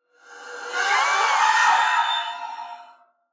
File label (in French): Banshee Cri; Phasmophobia